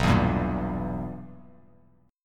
B Major 7th Suspended 4th Sharp 5th Bass Standard, chord; BM7sus4#5; strummed; JGuitar